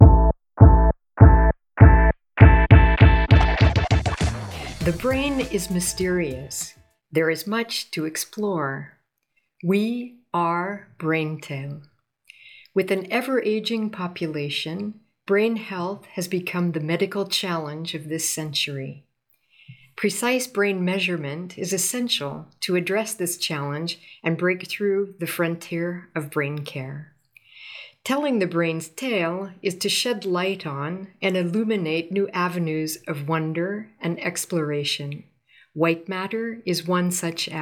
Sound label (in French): musique; Movavi